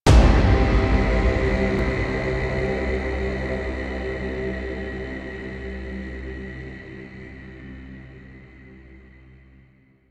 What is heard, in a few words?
Combat Cue End, Music; Warframe; DE; Entrati; TennoCon2023; Quest